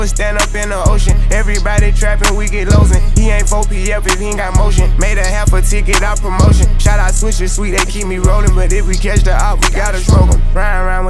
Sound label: English Song Ringtone